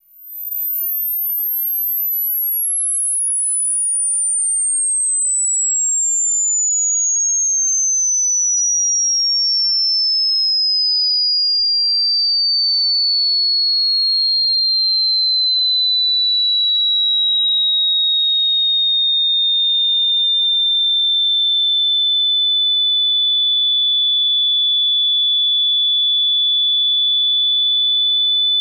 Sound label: huawei ringtone song